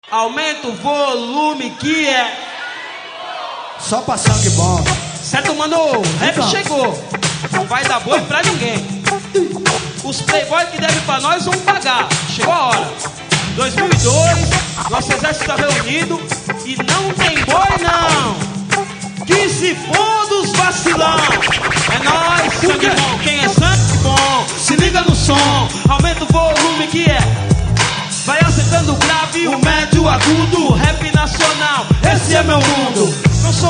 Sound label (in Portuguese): rap ao vivo; sampler rap